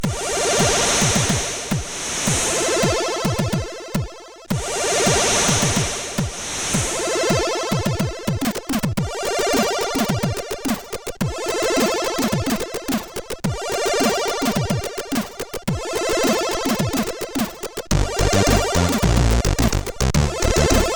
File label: Shuffle, CSG8580R5